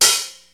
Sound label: DPM3 kit, PEAVEY DPM; Rubber Chicken Software Sample Bank